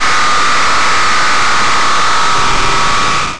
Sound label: jumpscare sound; scars redux